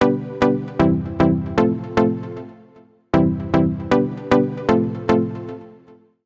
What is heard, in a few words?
Pluck (G) 115bpm; Music Loops; Mouse House; Ableton; Singularities